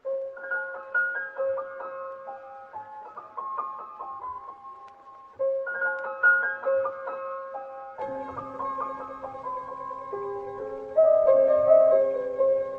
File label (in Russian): spokojnij budilnik